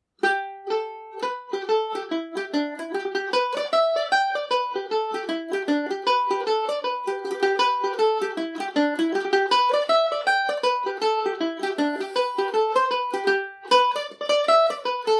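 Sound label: mountain top g major, mandolin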